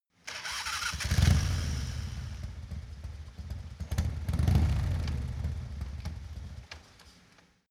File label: motorcycle harley ignition start engine